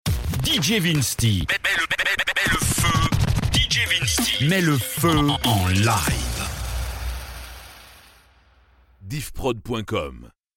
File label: Sweep; Le feu Live